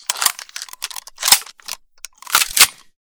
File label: sks load, weapon; metrocraft